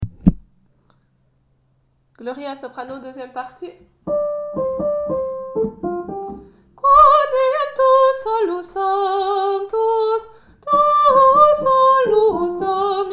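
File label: dubra gloria sop, soprano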